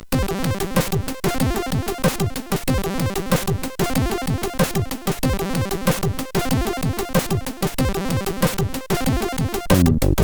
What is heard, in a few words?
Newspress, CSG8580R5